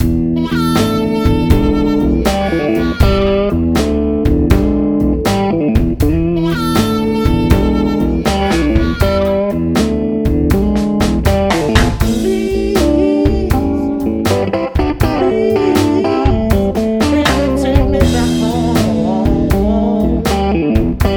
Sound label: 50s electric blues